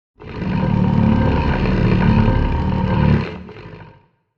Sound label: Dragon distant growl warning